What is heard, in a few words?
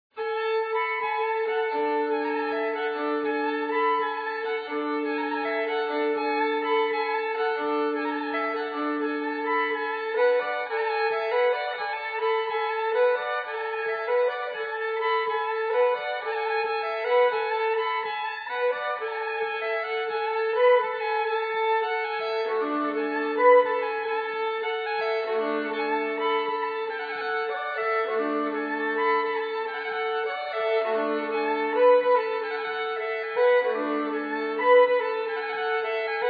Gangar Fiddle; Etter Myllarguten; grieg